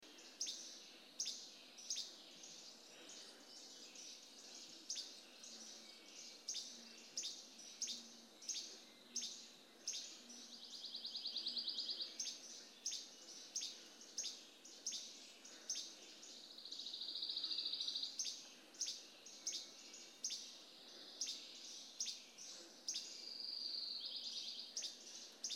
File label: Least Flycatcher Pine Warbler; Adirondack; Sand Lake; oven lake trip; hiking